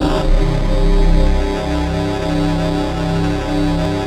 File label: synth clav